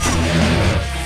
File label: star lost; fofix